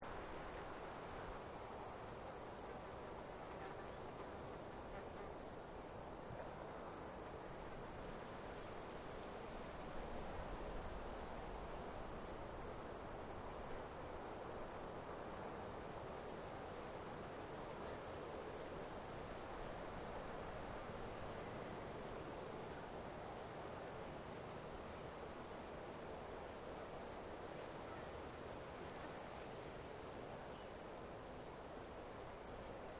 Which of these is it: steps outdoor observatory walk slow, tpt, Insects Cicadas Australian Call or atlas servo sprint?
Insects Cicadas Australian Call